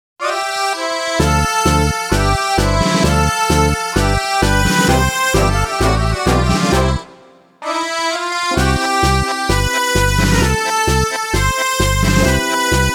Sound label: minigame bgm dice game